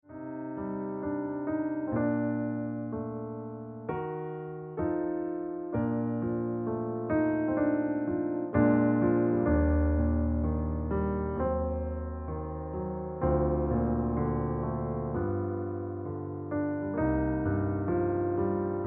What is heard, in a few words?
Piano Music